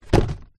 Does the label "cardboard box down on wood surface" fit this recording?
Yes